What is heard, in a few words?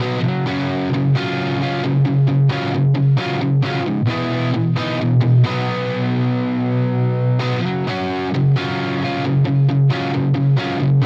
Vintage Neck Dirty; BYOG